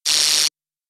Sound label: electricity; slide block